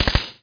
MP5sd 3 shot; SpecOps2

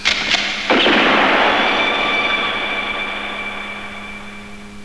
gunshot

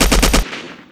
Anti Aircraft Heavy-Shoot; 1944 Battle Of The Bulge

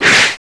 Fire SR Torpedo; Covert Action; Strategy

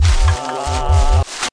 swap

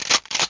shot load; alienbreed3d2

